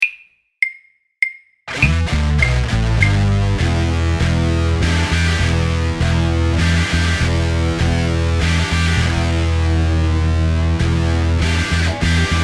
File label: Waltz, drum kit; Inside Out Waltz; Performance Mix